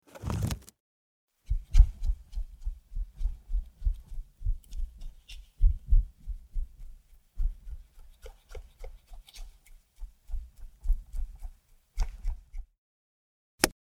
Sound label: sensu